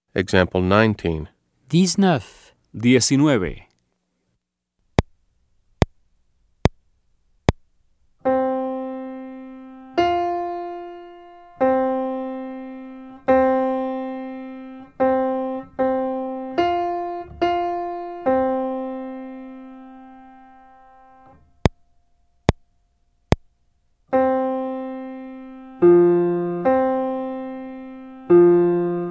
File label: Keyboard